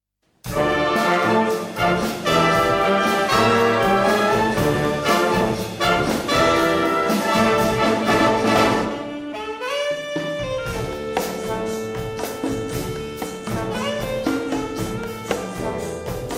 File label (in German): ungarisches Orchester im Saal; Orchester aus Ungarn; Das Orchester spielte in einer Halle, wobei die Wand hinter dem Orchester mit großen Vorhängen abgehängt war